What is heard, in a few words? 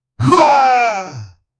a death cry like a